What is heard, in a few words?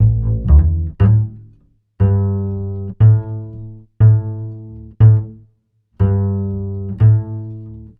Upright Bass, Ukraine Gypsy